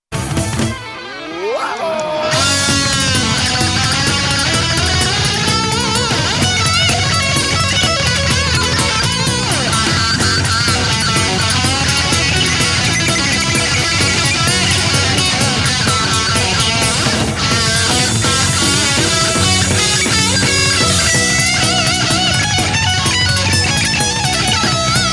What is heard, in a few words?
solo